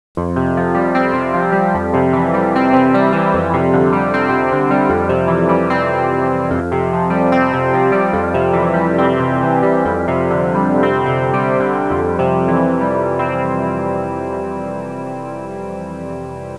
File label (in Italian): audio suonerie musica mistica